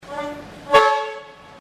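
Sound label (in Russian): гудок паровоза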